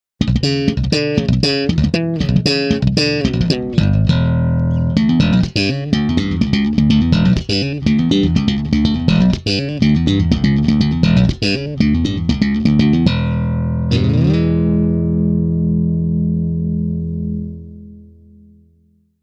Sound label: BASS